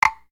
Golf Hit Barrier

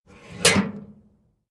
Mailbox close

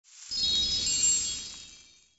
single pixie dust

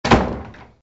Door Close

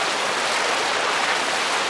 KART Skidding On Grass

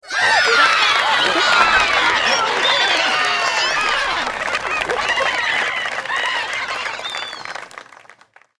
Golf Crowd Applause

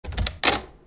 Door Open

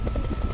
Cog Propeller

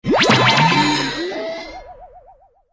head grow back only

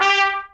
match trumpet

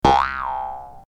Bounce

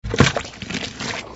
Quicksand deploy

